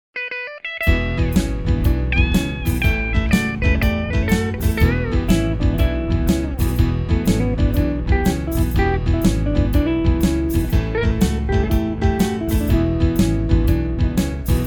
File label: Bluz; Soundzabound Volume 1